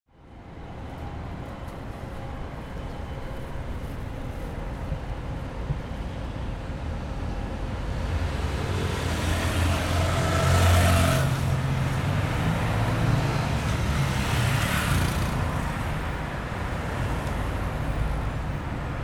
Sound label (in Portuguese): Jardim Botanico Estudio